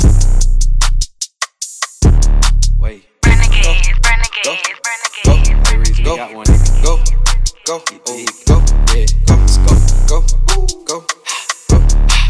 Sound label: emote just home music; left4dead2; kodua; fortnite emotes